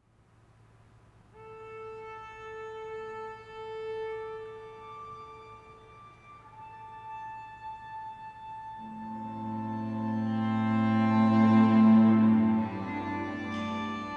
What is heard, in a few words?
String Quartet, Lots of reverb